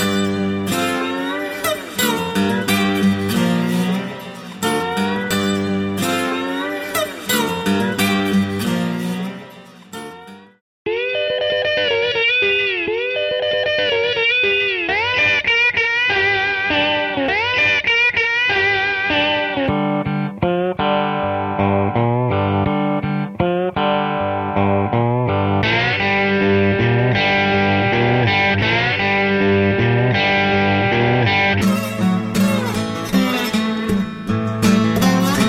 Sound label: slide guitar bottleneck bliss